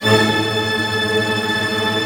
STR TRM D#, STRING TREM; Sound On Sound - Peter Siedleczek's Orchestra

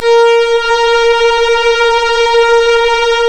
Synclav FM STRINGS A#; Big Fish Audio

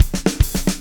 POWR, Backbeat, SHUF

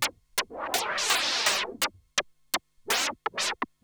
Juno-60 Arp 125BPM; noise hiss crackle

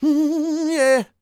MMM, Vocal Blues Soul; Spectrasonics; Planet

